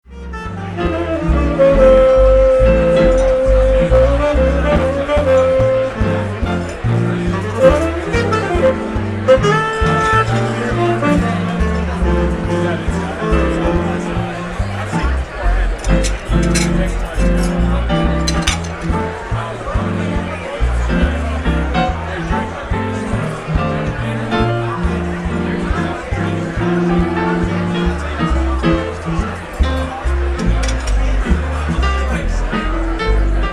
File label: Blue Room trio